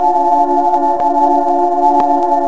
fast, engine; ships; Quantum Redshift; XBOX; tara

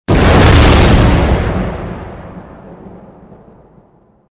explosion punch; weapons; Soldier Of Fortune II Double Helix; XBOX; explosions

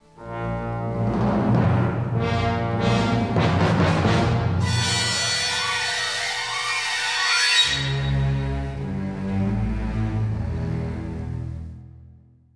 tension, music

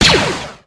laser fire; Tony Hawk's Project 8; XBOX

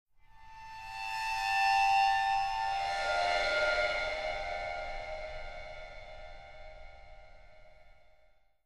theme stinger; Myst III Exile

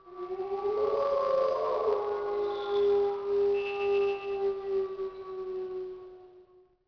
wolves; Ambient; MX vs ATV Unleashed

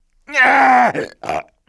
Bum HURT; TrueCrimeStreetsOfLA; XBOX

